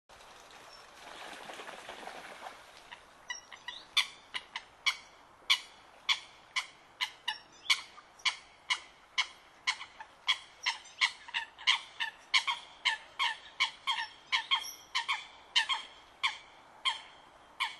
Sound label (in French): Foulque macroule